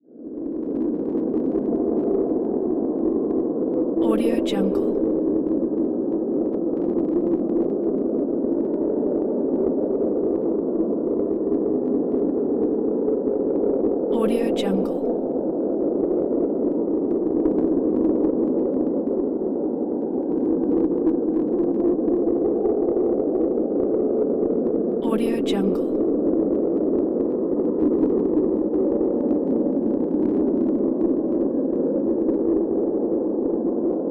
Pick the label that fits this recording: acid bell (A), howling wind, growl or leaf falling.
howling wind